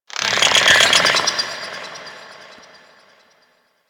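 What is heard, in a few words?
Settlement distant Insect; Ambience; Warframe; Grineer Settlement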